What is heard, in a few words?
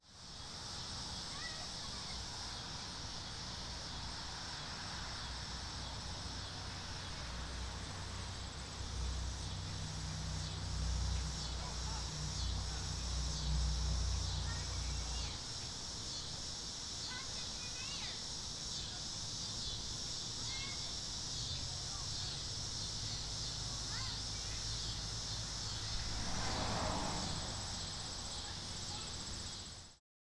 Summer Neighborhood, Outdoor Ambience